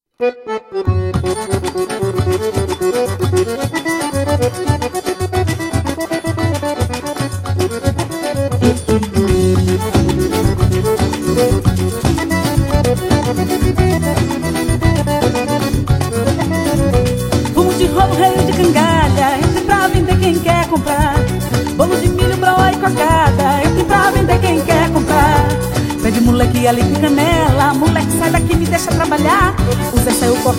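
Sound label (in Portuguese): forro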